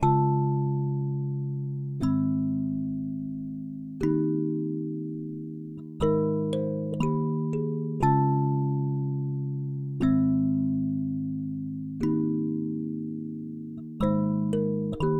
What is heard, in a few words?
Marimba Chords Fm 120; Sample Banks; Melody; Keyboards